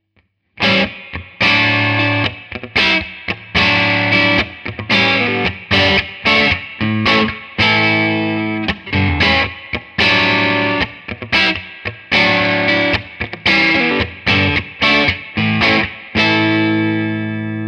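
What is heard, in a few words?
Koch Amps Little Gristle Reverb, guitar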